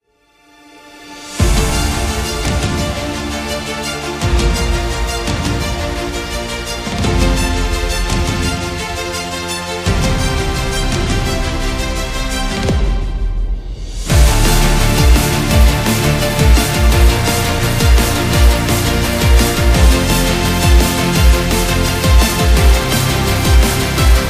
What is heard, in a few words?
Epic Drum and Bass